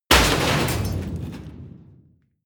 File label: drop metal crush